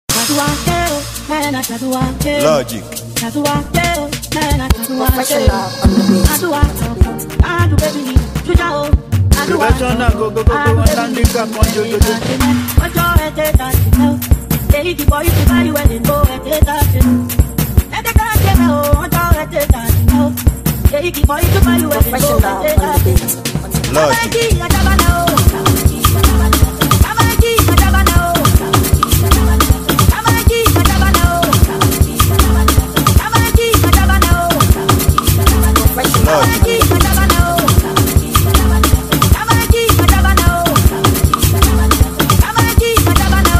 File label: Professional Beat